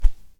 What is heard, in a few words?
arrow; weapons